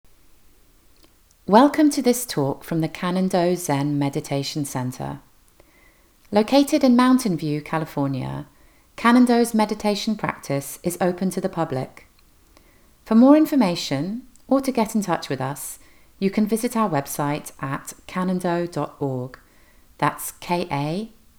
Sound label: 80s Sirens Sutras